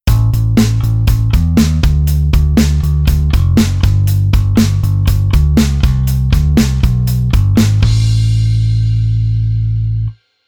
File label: Bass Arr CS Appro Aprro